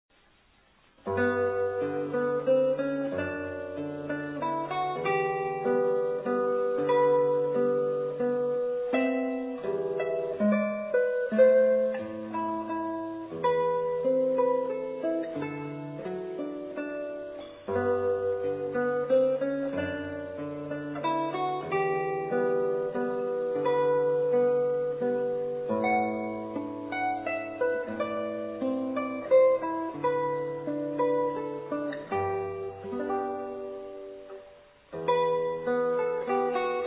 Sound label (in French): valse lente; merikanto oskar